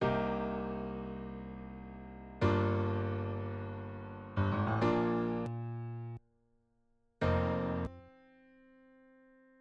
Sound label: piano